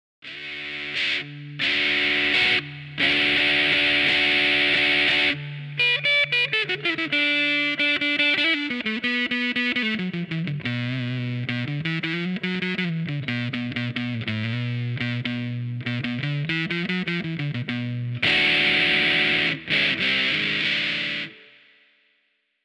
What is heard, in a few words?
scooped blues